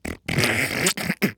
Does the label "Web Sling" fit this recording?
No